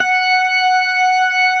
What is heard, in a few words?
STOPS, Synth and Organ